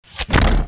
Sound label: fire Crossbow